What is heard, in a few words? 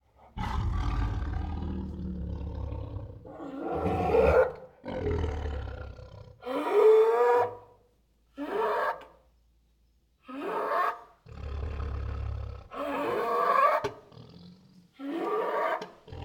Two Tigers Make Many Throaty Breaths and Growls; Sound Ideas